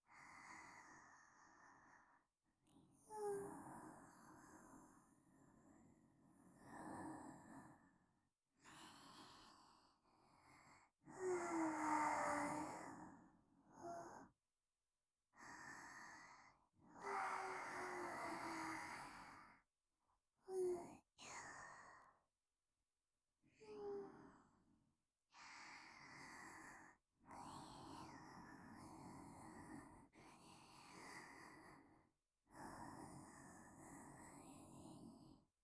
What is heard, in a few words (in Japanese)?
寝息ループでわぉわぅ♪